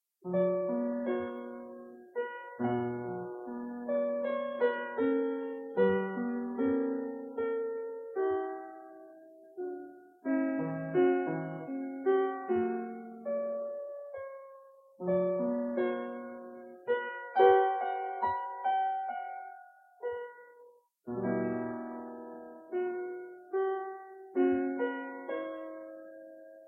theme and variations

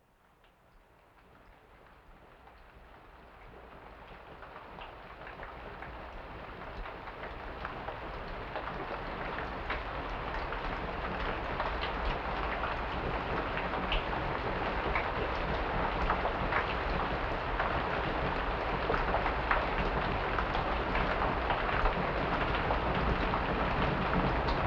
rain background

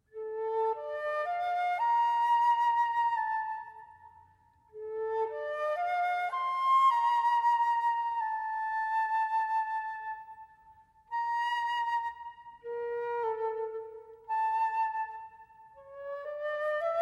solo for flute

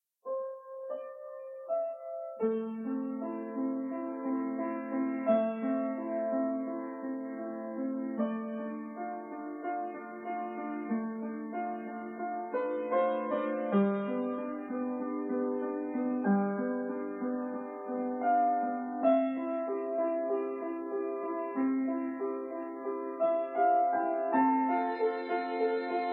piece for piano, violin, and two voices

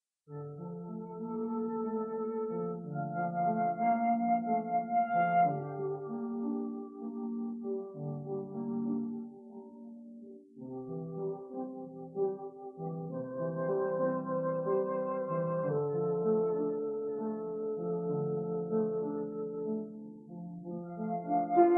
pieces for piano and flute

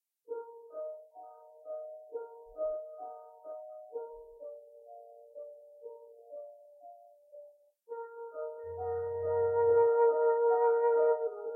pieces for piano and flute

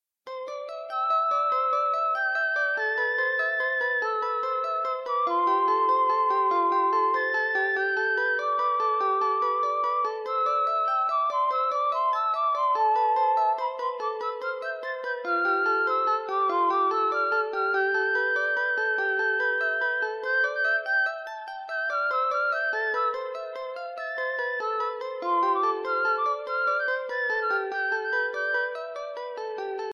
pieces for flute and guitar

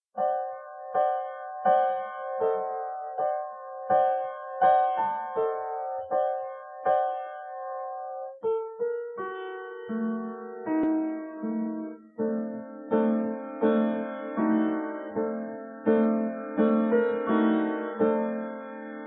pieces for piano

